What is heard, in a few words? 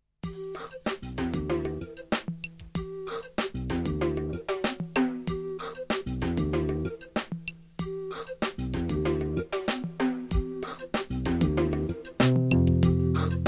yamaha sy85